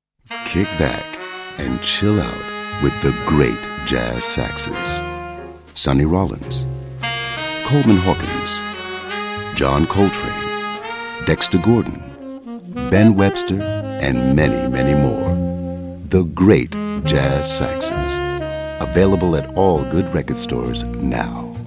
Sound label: Great Jazz Saxs